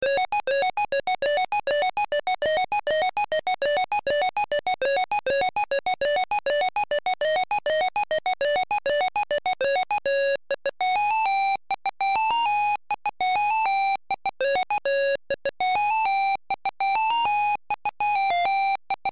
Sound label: MONO tune